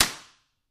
Belt Hit Slap Flat

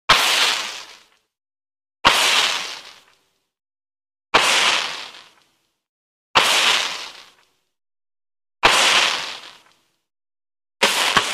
Electrical Spark Siz, shocks zaps

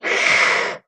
Ocelot Hiss Higher

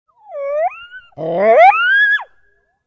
Coyote Howl, Single. Medium Perspective.

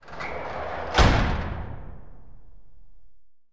Jail Door Large Metal, sliding

